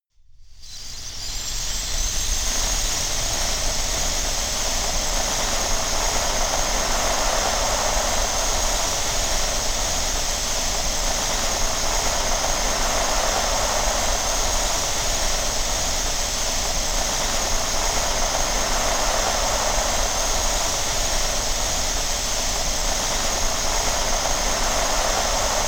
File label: tinkling cricket, music elements